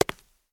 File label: Step Marble